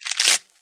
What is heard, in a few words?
bone break